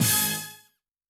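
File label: Brass Hit; Yamaha DTXpress-II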